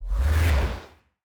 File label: Swoosh Transition